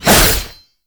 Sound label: Shield And Sword Whoosh; Mortifor